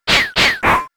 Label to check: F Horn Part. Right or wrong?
Wrong